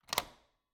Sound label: Cabin, front door, deadbolt, lock, latch, thick, open, strong, wide; Eiravaein Works; Vaeyan IV